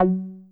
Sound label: POKE BASS; BASSES